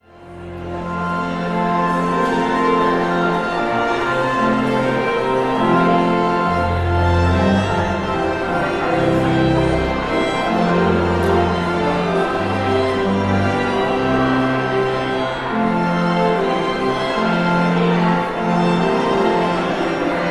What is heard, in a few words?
Postlude